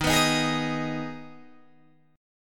Eb Suspended 4th Sharp 5th, Guitar, Open G

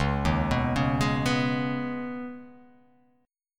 C sharp 7th Suspended 2nd; down; chord shape

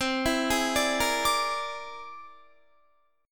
C Augmented 9th, Guitar; arpeggiated; JGuitar

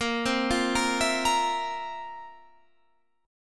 F Major 7th Suspended 4th

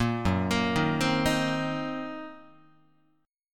Gb 6th Flat 5th Gb down Guitar; arpeggiated; Gb6b5; 1,2,1,1,4,2; JGuitar

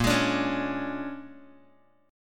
Bb 7th Sharp 9th Bb, Bass Standard; Bb7#9; strummed; JGuitar

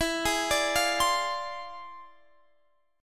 E 7th Flat 9th Sharp 5th; E7b9#5; arpeggiated

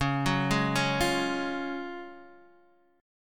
F sharp Major 7th Suspended 2nd C sharp

